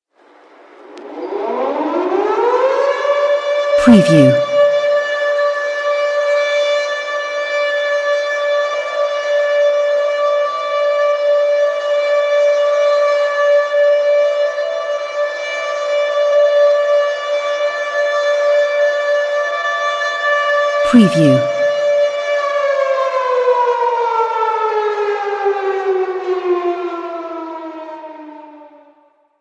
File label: siren